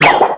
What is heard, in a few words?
punch mega; impact